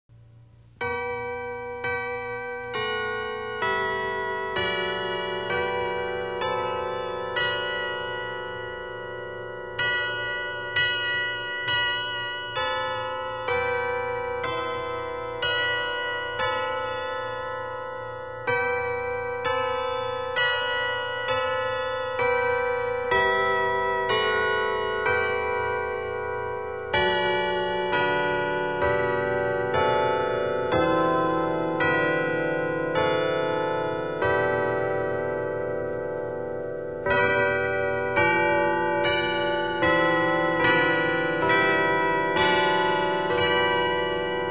carillon chimes

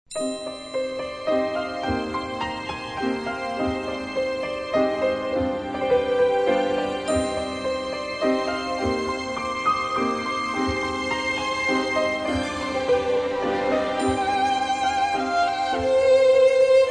Orchestra, Strings

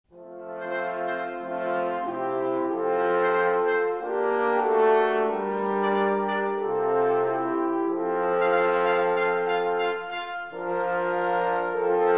Orchestra and Choir, Hymns

